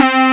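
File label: square; sound canvas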